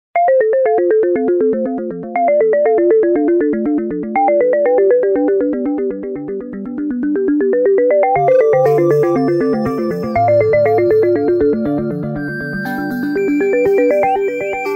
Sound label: nokia sms ringtone; Category Nokia Sm